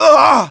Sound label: Damage Fly; Ike